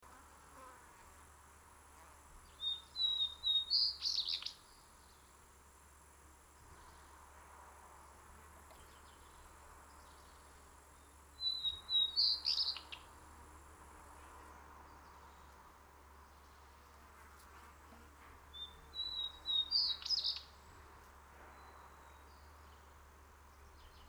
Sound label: White bellied Redstart Luscinia phaenicuroides, white bellied redstart call